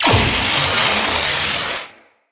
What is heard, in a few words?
Energy Leech Activate; Eximus